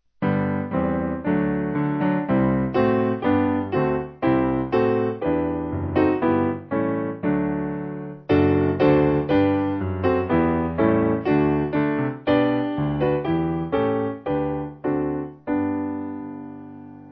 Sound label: Piano